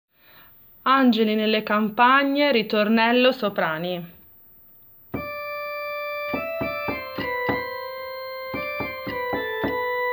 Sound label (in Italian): Angeli nelle campagne ritornello soprani